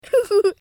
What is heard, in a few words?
giggle; cutiepugs